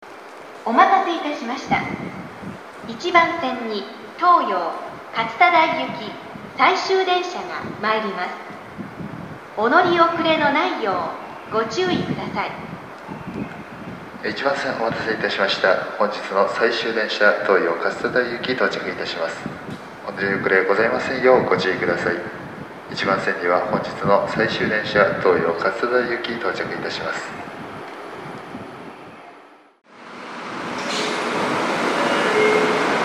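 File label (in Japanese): 駅放送, 1番線最終; funabashi-nichidaimae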